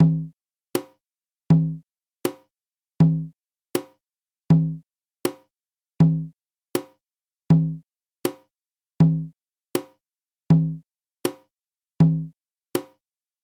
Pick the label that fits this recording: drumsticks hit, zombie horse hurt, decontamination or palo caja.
palo caja